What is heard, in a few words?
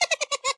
spider win; cuttherope